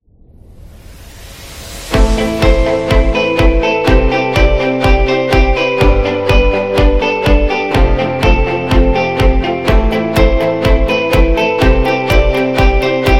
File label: Serious Motivation, corporate music; corporate music vol 1